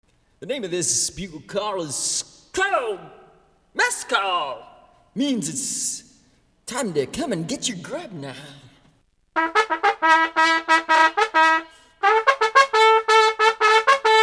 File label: Bugle Call Mess Call